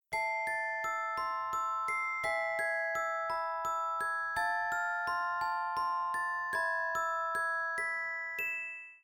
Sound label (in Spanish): coche